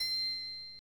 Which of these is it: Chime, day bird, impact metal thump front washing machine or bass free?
Chime